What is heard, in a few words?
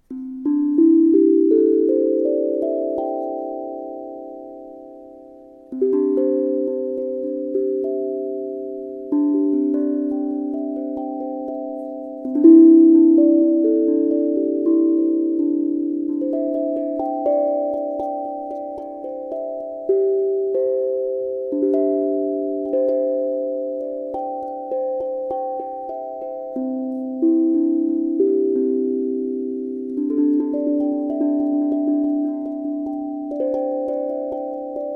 Do major 440